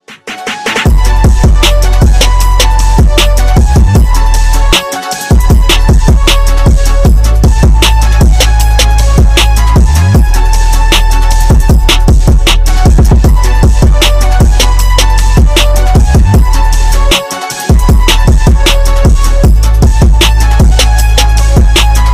phonk edition

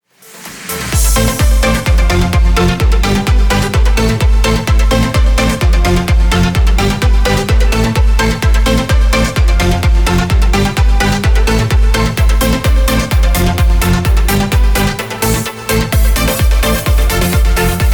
stepup remix

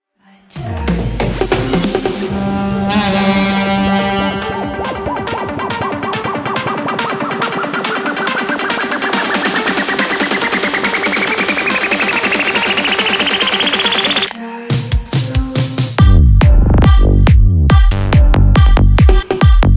psy trance

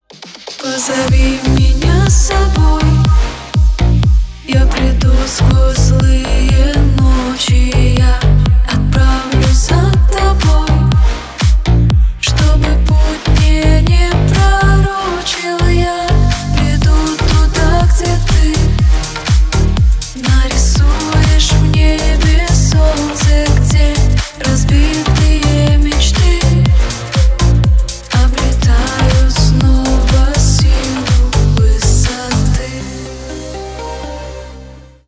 remix

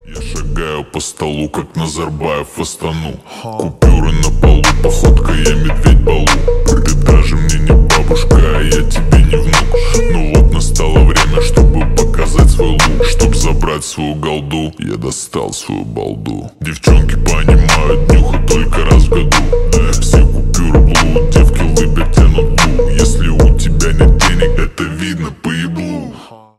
slowed reverb

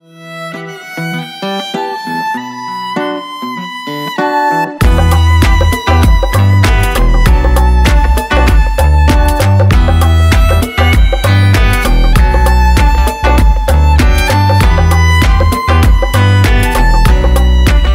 cover instrumental

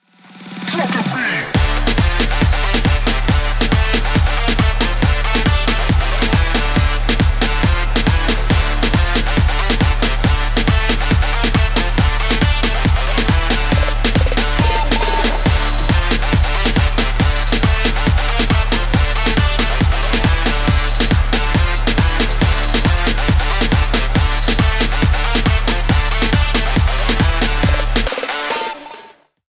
trap remix

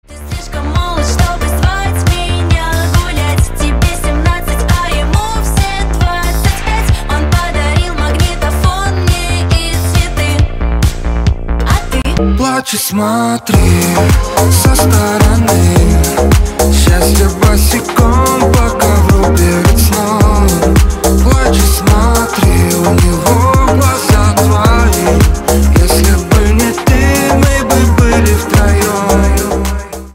remix

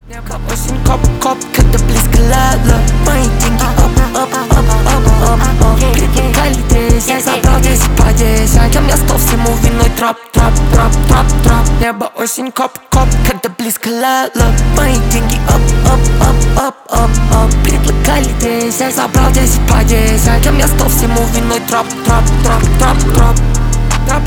trap

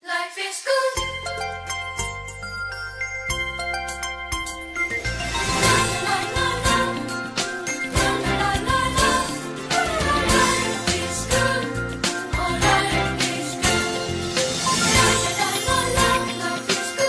LG G series G2 G2 Pro

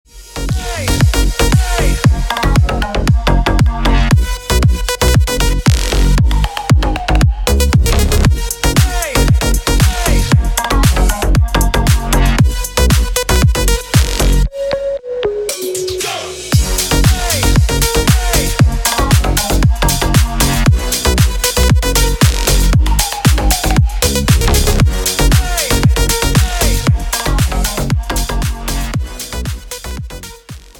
jingle bells

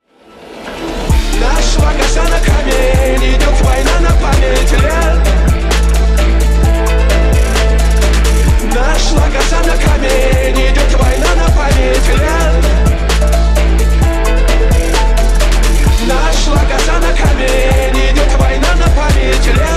phonk edition

